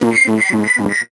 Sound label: VocoBit Noise, 8-bit; musicradar; 8-bit bonanza